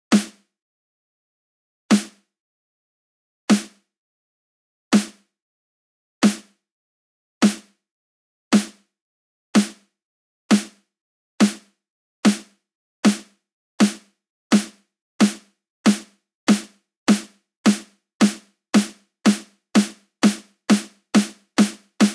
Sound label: snare accelerate